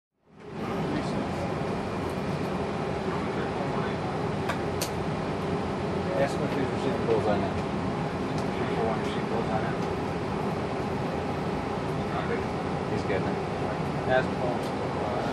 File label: Military Transport plane ambience